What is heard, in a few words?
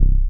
Standard Bass C#, One Shot Bass